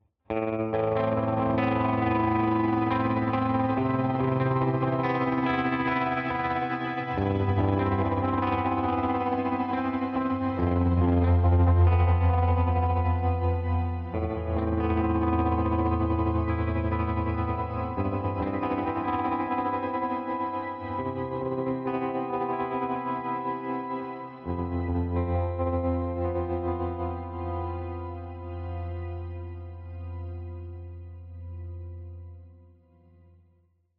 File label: Delay Trem Reverb Shimmer; Nocturnal